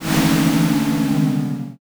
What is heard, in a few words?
sigh crowd